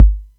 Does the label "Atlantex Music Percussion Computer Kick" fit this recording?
Yes